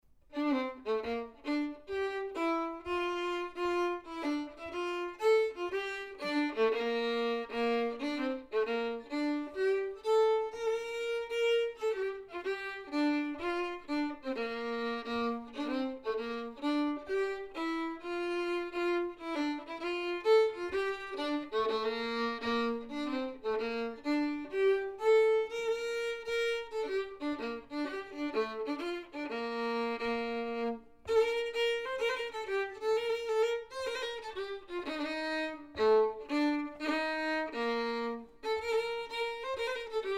Schottis stämma långsam; från Norge

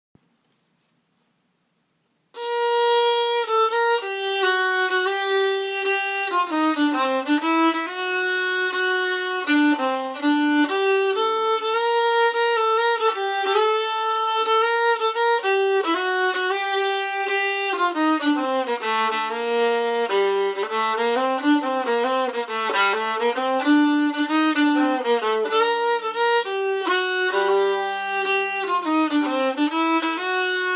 polskan andrastamma langsam; Jarvsopolskan; g mollpolskan

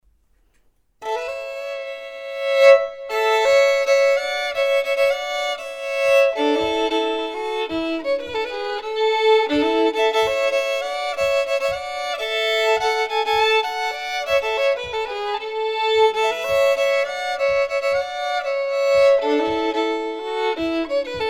Malstu Håka melodi med dubbelgrepp

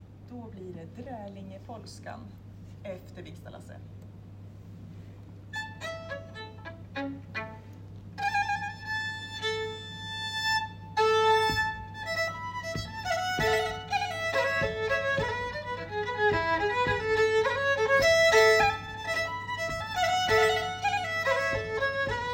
polskan; Dralingepolskan; efter Viksta Lasse

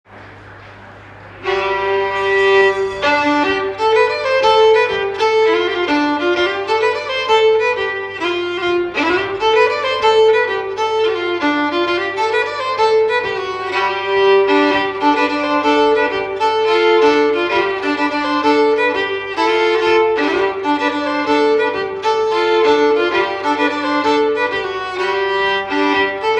Resårpolskan melodi

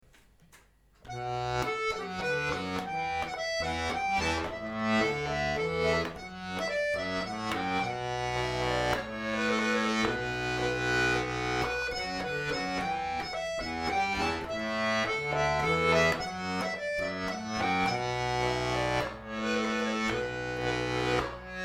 basstamma; Juringius

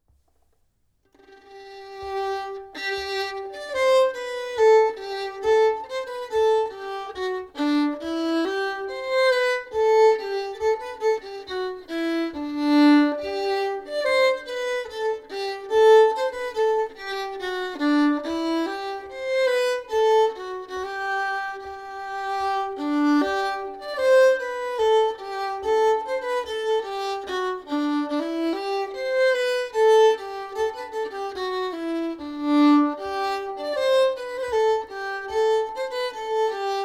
slängpolska melodi; Monicas

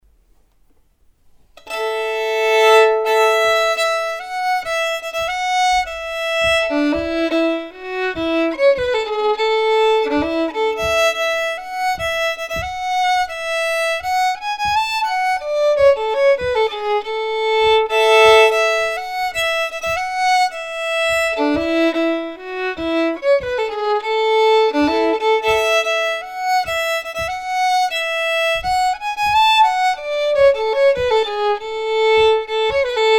melodi en ton, Polska; efter Malstu Håka; Hakan Andersson

